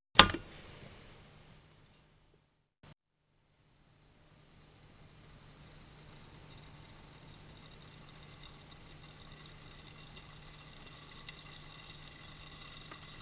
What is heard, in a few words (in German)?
Wasserkocher; Haushalt